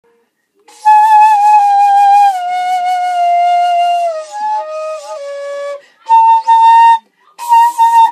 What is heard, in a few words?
Flute